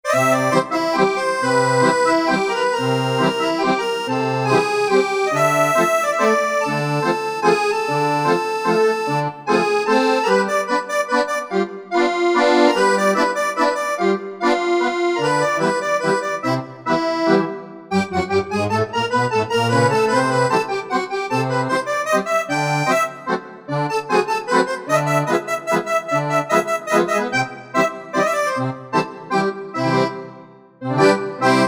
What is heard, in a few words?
Musette, Korg MSC-9S Organ